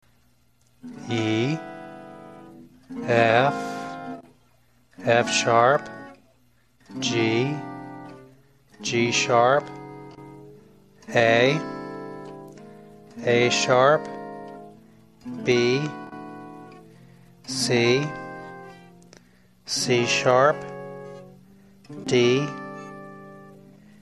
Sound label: barre, guitar; E shape barre chords